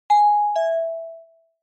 Doorbell Fake, Close

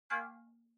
OS Sound (Speaker-Notification)

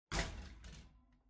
Electric Fan Far Knock Over

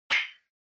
Retro Anime Switch High

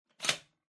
Vacuum Cleaner Handle Attach

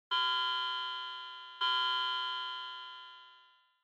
Wall Clock Time Signal

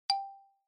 Xylophone, Single

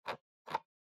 PC Mouse Scroll Fast

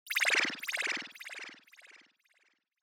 Scene Change Down Delay

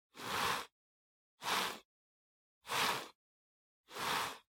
PC Mouse Move Hard Pad Mid

